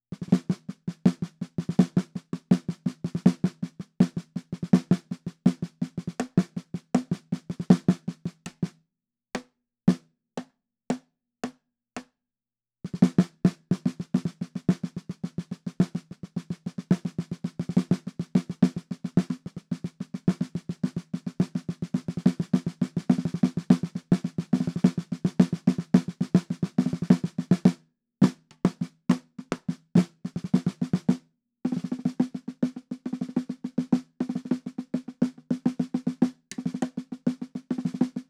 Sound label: perc intro snare